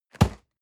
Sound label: box hit hard dry